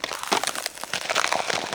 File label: become Ice Block; blobAndConquer; combat